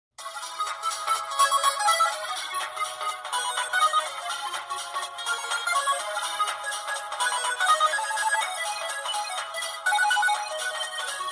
着信音 Softbank

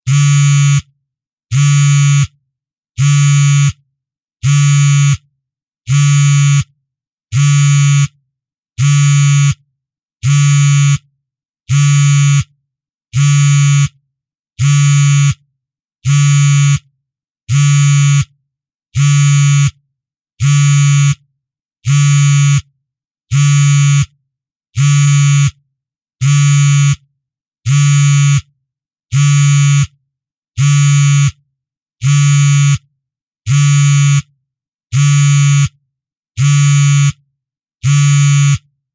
バイブ音

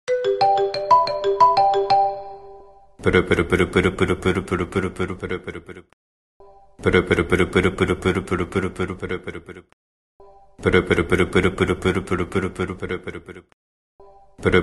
iPhone 電伝虫風

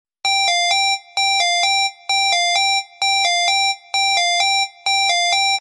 ファーストフード店 の ポテト が 出来上がった 時 の 音

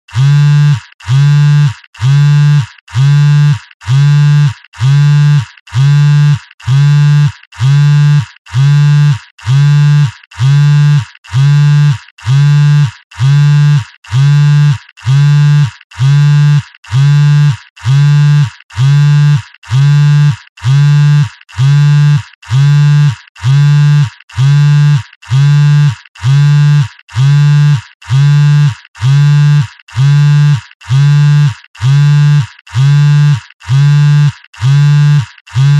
携帯電話 の バイブ音

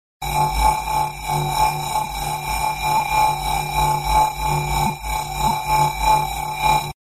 連続SFアラーム音

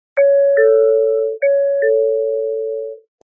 新幹線のドアチャイム

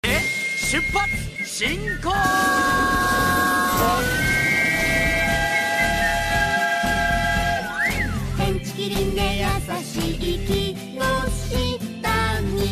シャキーン

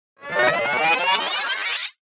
テープの巻き戻し

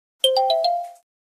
LINE メツセージ

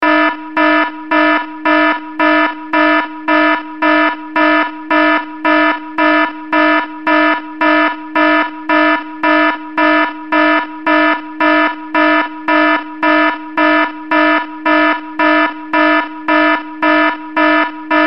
アラーム 警告音

